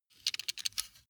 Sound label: sunglasses metal take off